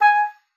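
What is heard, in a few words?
oboe d'amore G#5; Xsample VOL-7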